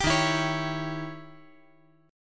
D sharp 7th Flat 9th; strummed; JGuitar

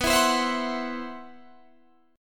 C Major 7th Flat 5th B

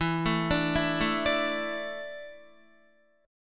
E 7th Suspended 4th, Guitar; E7sus4; arpeggiated

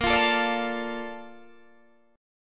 A sharp Major 7th, Guitar DADGAD

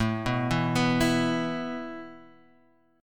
A Suspended 2nd A Guitar Half Step Down; Asus2

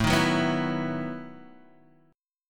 E Major 7th Suspended 2nd Suspended 4th

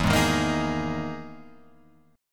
E Major 7th Flat 5th E, Guitar, Open F; strummed; JGuitar

